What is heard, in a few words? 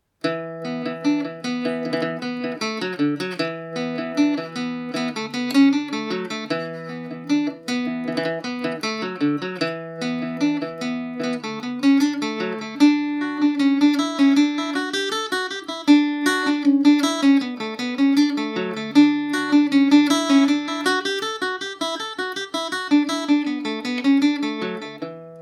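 E dorian, Irish mandolin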